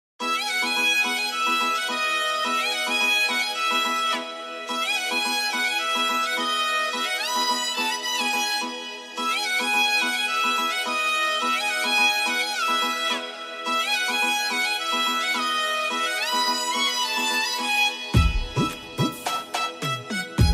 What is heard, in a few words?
sarangi tone keyboard cover instrumental ringtone; pushpa